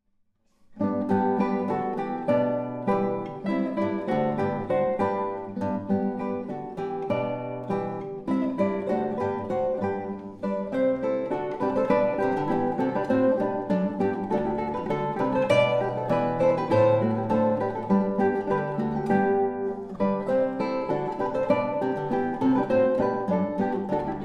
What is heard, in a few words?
Air, Trumpet Suite in D, Guitar Ensembles; Handel; Quartets